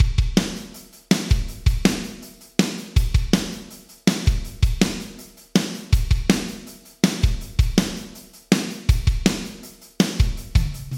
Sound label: Drums Sony DRE 2000 Reverb D 1s Vintage Digital